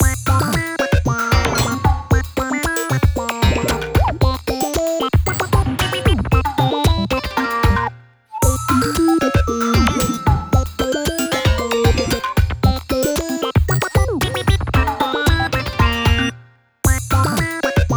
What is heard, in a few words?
Jingle Result Failure; Taiko no Tatsujin Atsumete Tomodachi Daisakusen 2015; Wii U